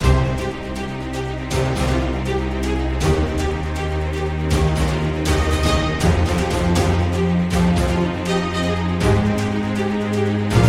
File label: Orchestral